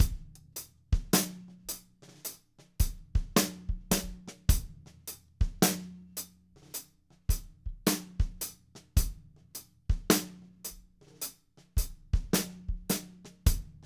107 SLOW GRV, Drumscores; Steve Gadd Drumscores; LO BPM

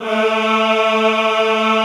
EHH A2, Classical Choir; Best Service

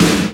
SD HOU, Dance Mega Drums; Best Service

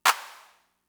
Clap; Fricke MFB-522

